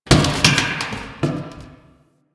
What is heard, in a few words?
Derelict Metal Creak Small; Warframe; Orokin Derelict